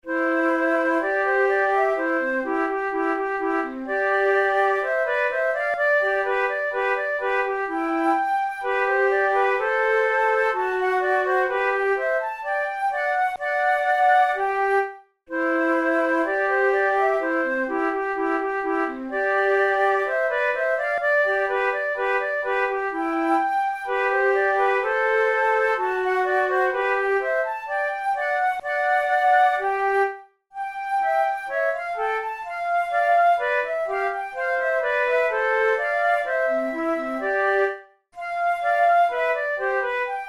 horn duos andante; mozart

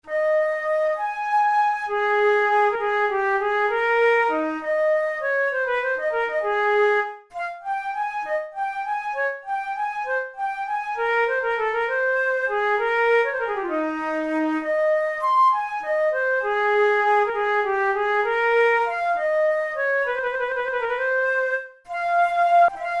studies for the boehm flute

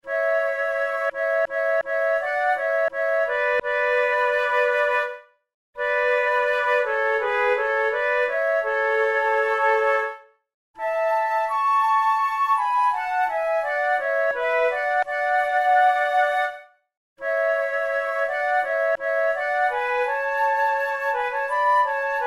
duet, flute; Moscheles; the Switzer's song of home

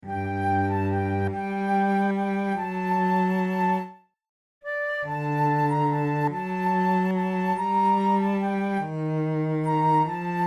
recorder sonata in g minor adagio; Marcello

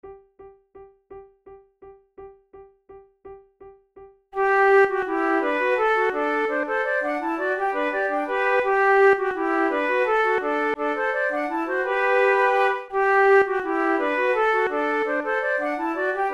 waltz trio

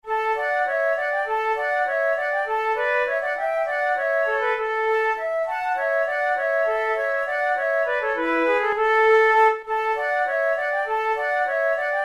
allemande, flute

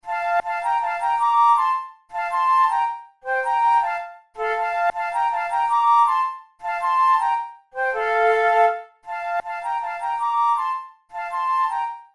duet

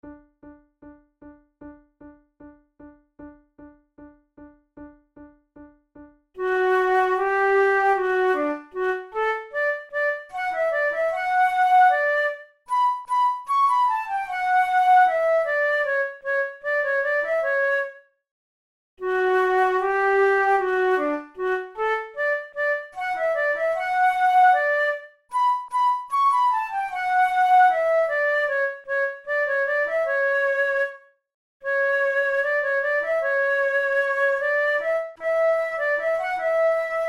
duet, flute; haydn; military movement

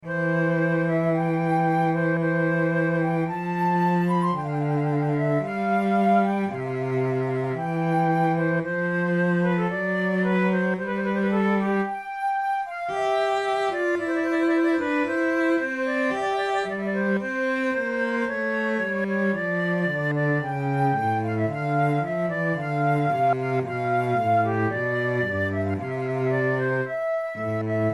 adagio, flute

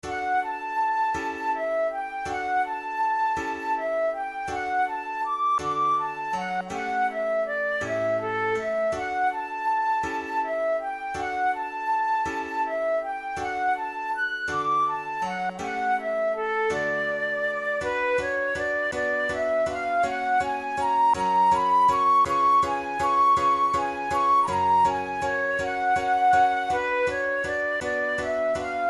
melody, flute tunes; demersseman; italian concerto; neapolitan

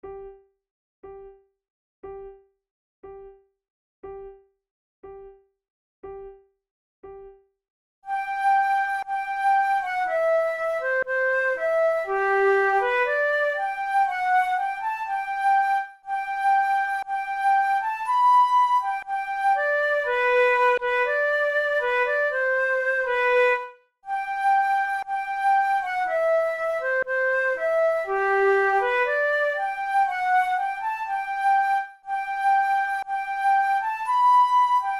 duet, flute tunes; Oft in the stilly night

